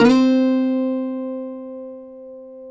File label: CUATRO C 4, Guitars; Spectrasonics; Hans Zimmer Guitars VOL-2